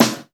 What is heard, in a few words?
Claps & Snares